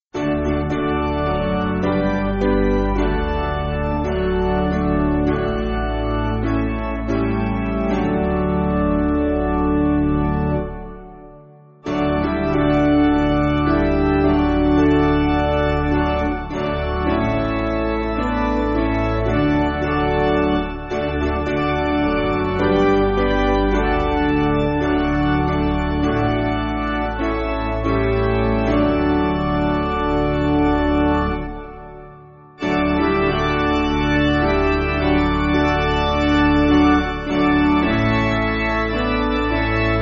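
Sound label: Piano, Backing Files for Churches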